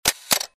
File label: camera shutter; Huawei U3220